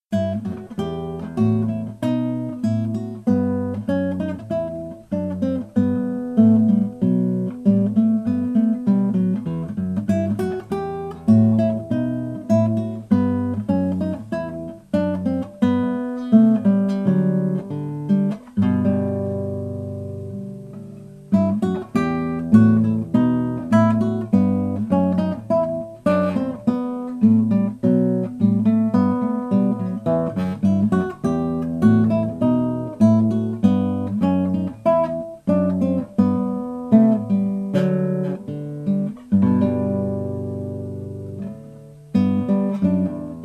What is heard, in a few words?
Bouree in E Minor